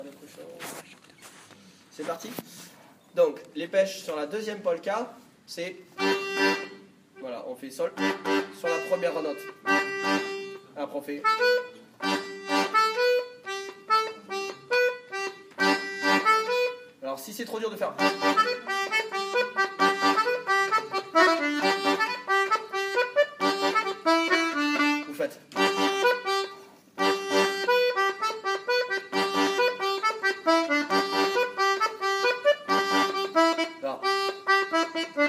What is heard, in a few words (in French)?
Polka version simple avec les peches; Finish set; Musique d-ensemble